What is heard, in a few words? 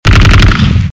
30 cannon